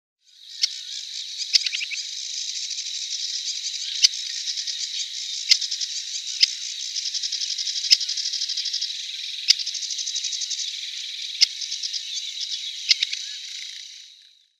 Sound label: Aquatic Warbler calls